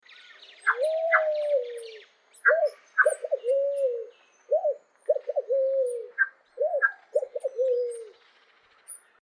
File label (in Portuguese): Canto da pomba do mexico